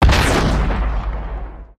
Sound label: gun shot; Crash Bang Boom